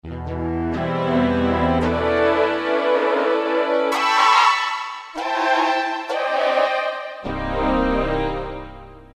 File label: Velo-Oct Pulse, Roland PN-D50-04